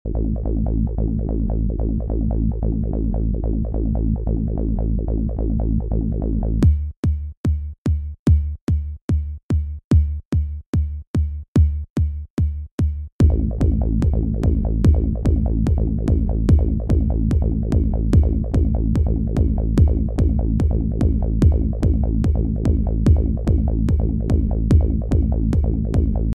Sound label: Bass Drum And Bassline